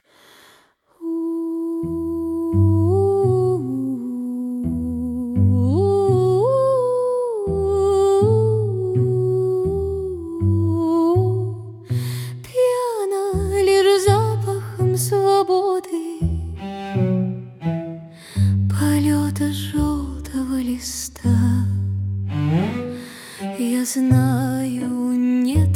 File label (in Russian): tango